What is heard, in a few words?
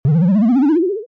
appear; basic games; SpaceInvaders